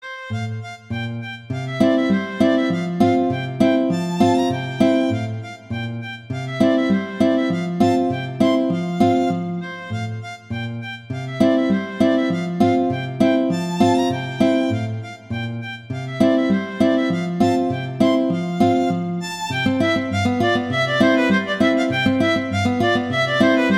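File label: Andantino Scherzando, violin guitar; Paganini; Sonatas; Op2; Sonata No 3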